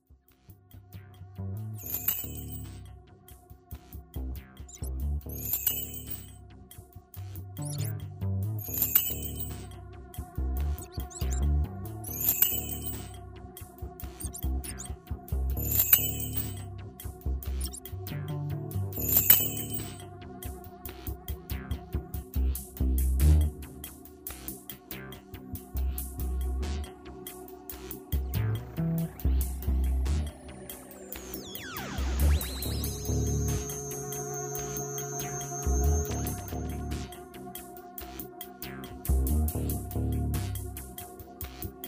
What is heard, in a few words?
ocean harp